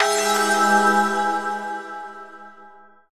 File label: lose